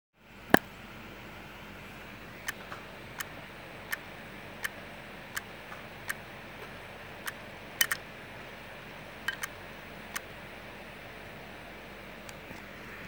Heads bad wd, damaged hard drive sounds

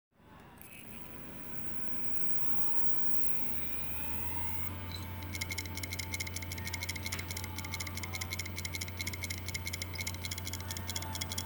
Heads 3.5, damaged hard drive sounds; 1TB E5E 3.5 PURPLE

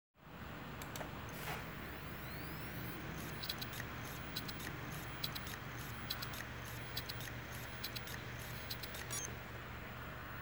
Heads bad hitachi 2.5, damaged hard drive sounds

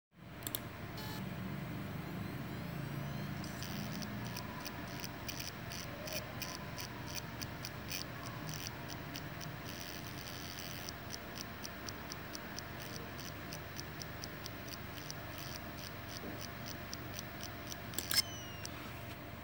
HEADS Samsung 2.5, damaged hard drive sounds

